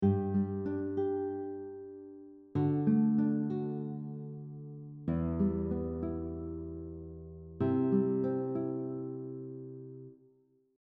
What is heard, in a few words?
Fingerpicking pattern two notes on a beat, guitar